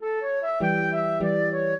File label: trio, flute harp; Mozart